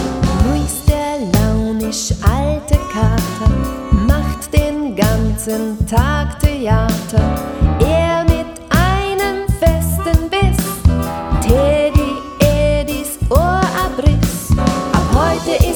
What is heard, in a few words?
Blues